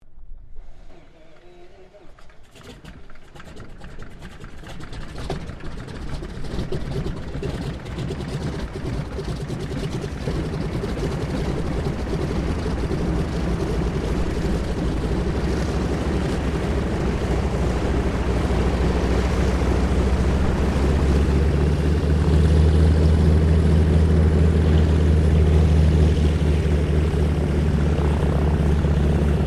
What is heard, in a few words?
AIRCRAFT CAC CA-25 WINJEEL STARTUP TAXI, fighter trainer; RAAF Winjeel; Airplanes